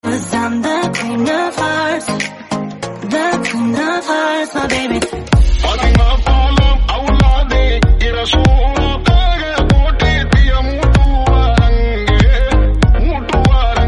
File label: romantic english song tone ringtone; english ringtones